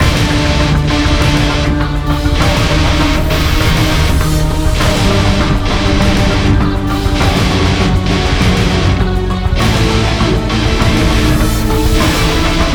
Musik Geiser Fight; BioSupremacy

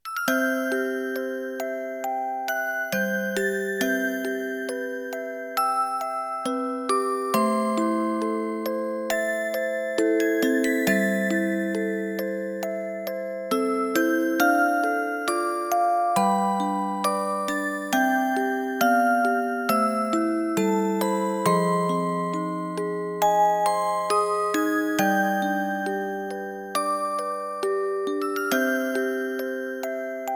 Orgel; Mugen Kairou

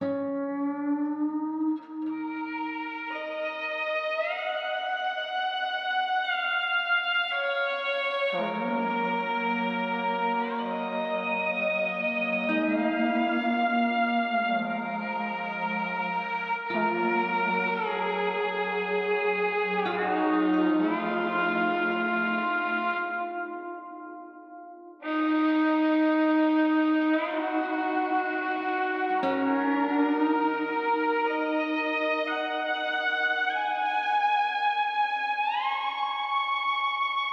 traurig lead

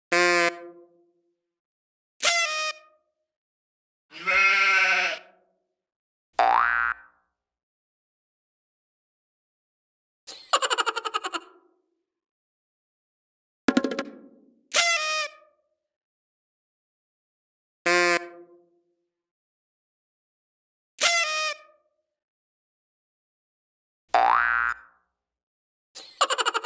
lustig drums

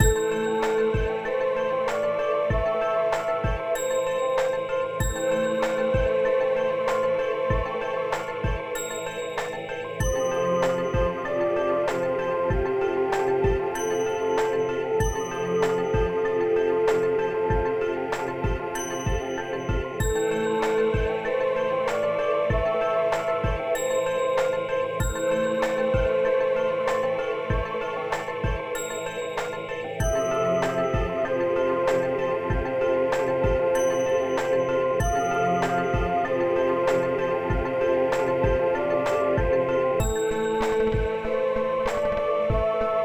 Spiel Magisch; Inkheart; NDS

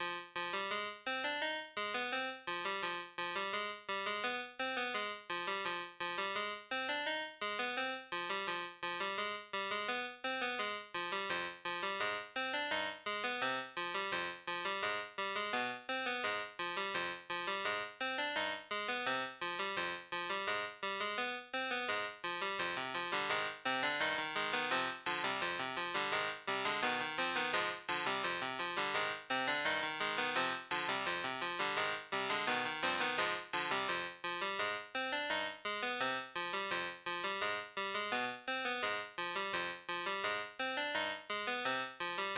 Music Feld Wildschwein-Attack

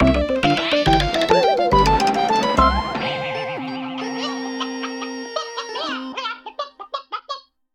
Fanfaren; Eggggg The Platform Puker; Android

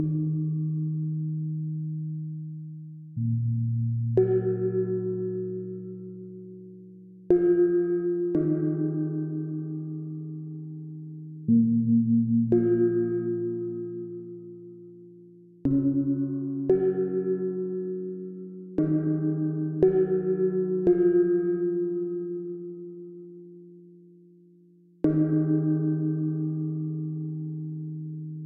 traurig rhythm

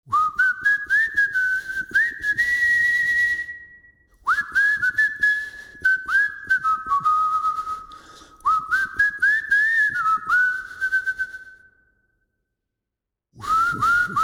fluffy lustig lead

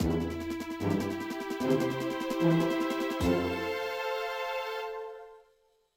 Music Wald Crossfade; Siebenstreichs Nerdventure

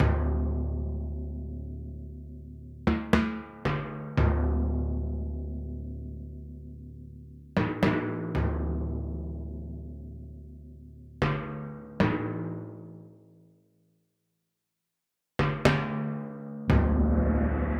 traurig drums

